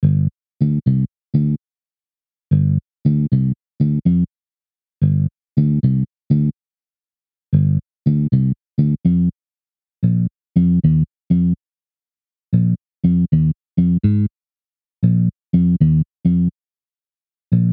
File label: Dark Church